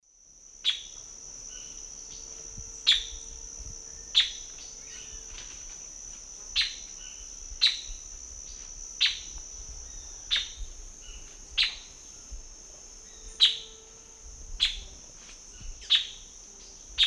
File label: Furnarius torridus, calls; S. America; Eastern Lowlands